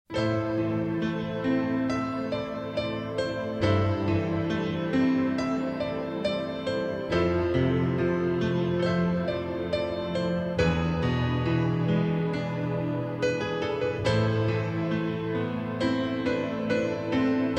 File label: instrumental; City Hunter - The Secret Service